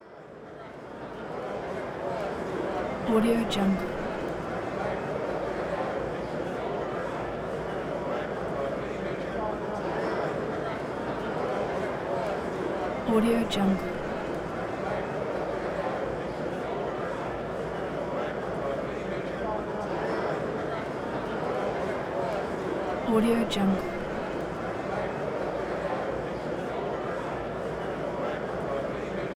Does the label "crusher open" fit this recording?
No